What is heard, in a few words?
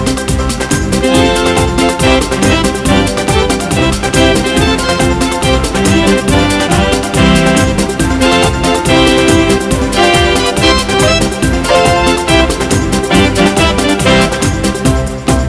Hot and Fast Merengue